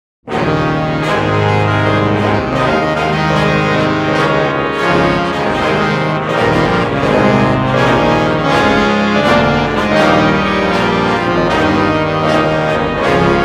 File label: March Band Balanced